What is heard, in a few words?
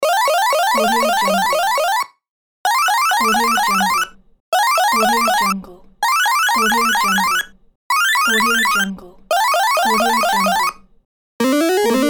8bit point counter mega pack